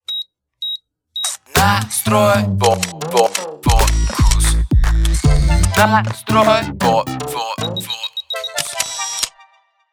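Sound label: Dzhingl, jingle; dzhingly dlya media proektov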